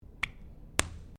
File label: GUN TOY DART STICK POP; Pops (Sound Effects:Objects)